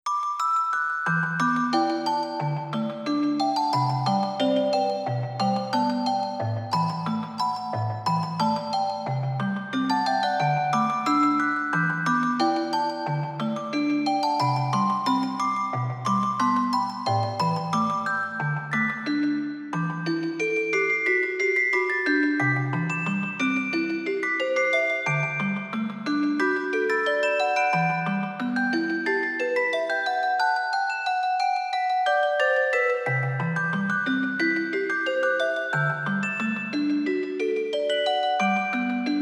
toy clock, Fluid R3 GM; WindSphere

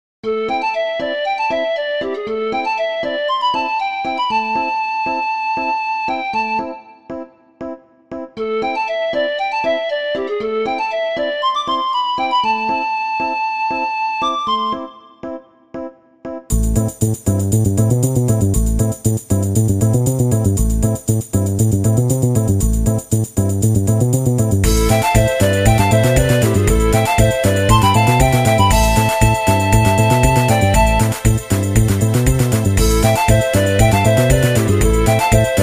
town; game; BGM; maoudamashii

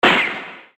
battle gun; maoudamashii

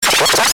magical; maoudamashii

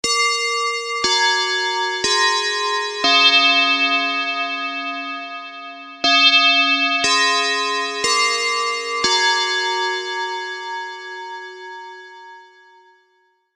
chime; maoudamashii

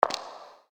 footstep; maoudamashii

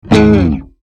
instruments bass; maoudamashii

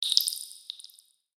element ice; maoudamashii

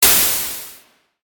element water; maoudamashii

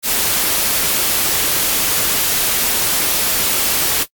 noise; maoudamashii

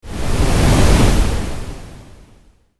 element fire; maoudamashii

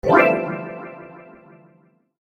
magical; maoudamashii

